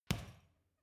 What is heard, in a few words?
boxing punching bag side hook medium